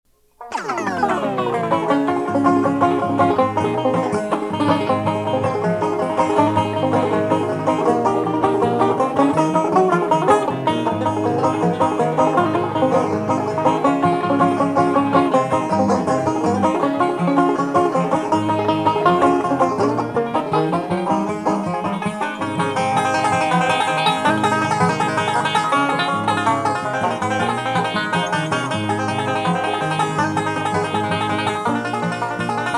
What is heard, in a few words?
Instrumental